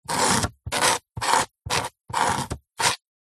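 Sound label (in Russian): zvuki perevoi ruchki i chernilnitsy, quill pen and inkwell sounds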